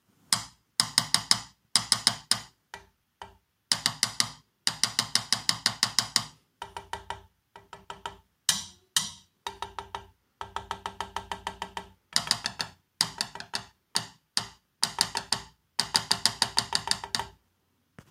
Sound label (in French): rossignol